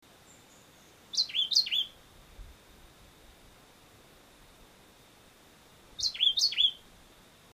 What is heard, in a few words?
Vireo modestus Barbecue Bottom Jamaica; West Indian